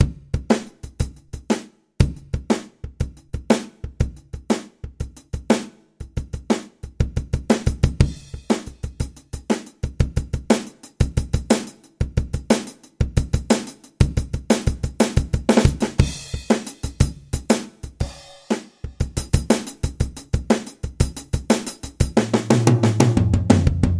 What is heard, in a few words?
drum